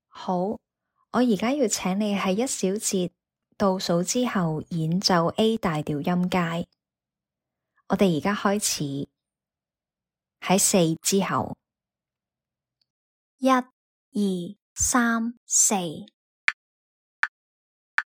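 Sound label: A major scale E flat major scale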